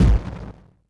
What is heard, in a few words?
hit; shrdlu; aventura4